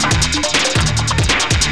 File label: Overdrive Modulated, Drums Beats